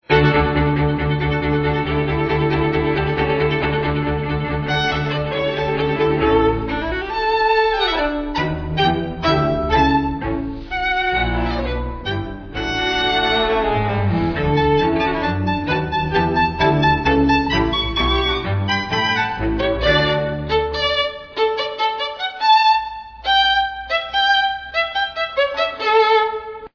music; disney channel; playhouse; little einsteins

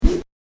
interface slide; phineas and ferb; snowbrawl

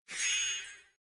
btn sm; snow white

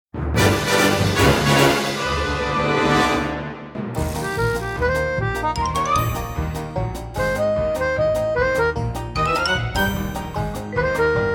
music; toystory; toystorymania

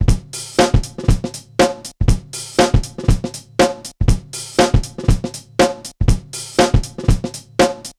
Beat; pooh; merry games; jammin

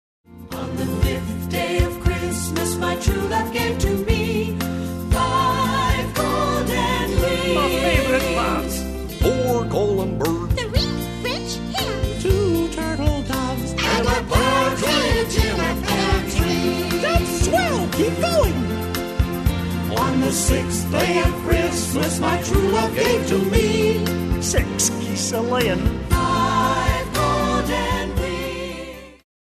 karaoke vocal twelve days of christmas; christmas favorites; disney

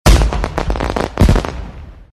fireworks; disneyxd; stuntastic

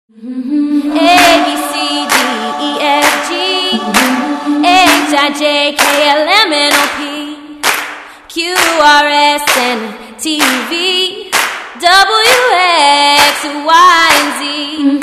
gospel; disney records; abc

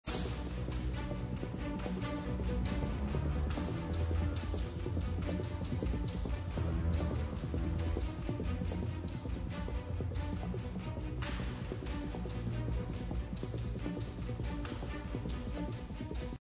menu music; avengers; city Under Attack

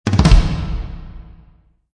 tom Roll

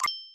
print; princess

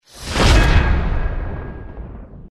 eat outro; disneyxd; stuntastic

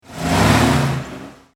Rev; Tex Caddy; cars

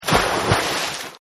splash Landing; kickbuttowski; mellowbrook mayhem

